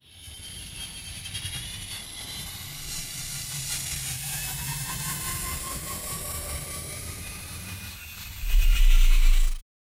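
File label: a giant alien robot marching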